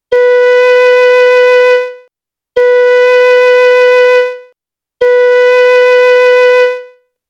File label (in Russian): 1 oktava 7 si flejta